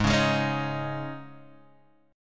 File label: G sharp Suspended 4th G sharp; G#sus4 strummed; x,x,x,1,1,3,3,0; JGuitar